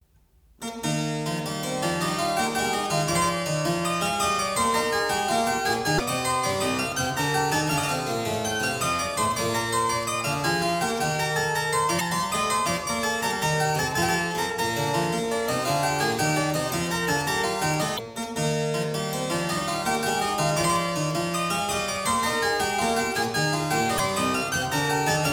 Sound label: French Suite No 4 in E Flat Major Courante